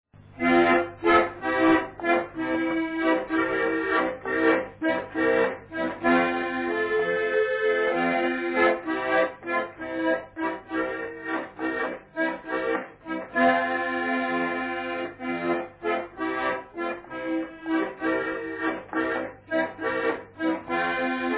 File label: meck walz